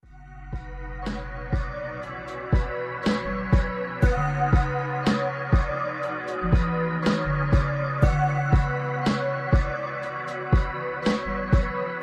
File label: Lofi beat; beatoven ai